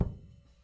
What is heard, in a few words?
Gentle, Kicks; Airwindows